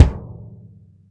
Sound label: BD, Bassdrums, Roland TD-20